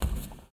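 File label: Foot Metal Roof Bare Walk; Lucius III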